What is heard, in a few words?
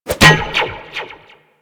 Zipline Grab; Gadgets; Parkour; Warframe